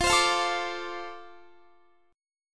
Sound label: F 6th F down Guitar; F6 strummed